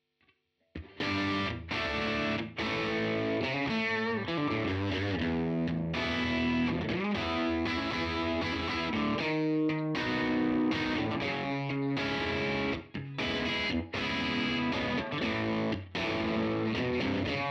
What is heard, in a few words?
Ruby 63 Bril Blue Room Boost